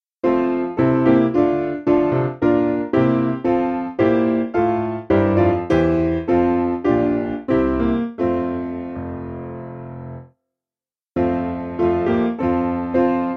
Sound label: piano, hymn